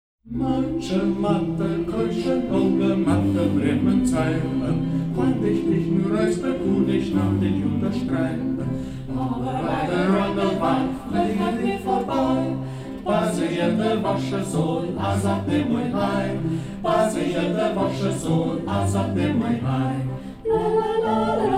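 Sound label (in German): Zeidner Gitarrenkränzchen; Ortsmundart: Zeiden; Hegt wird gesangen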